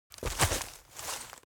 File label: step forest walk; foley; smartsoundfx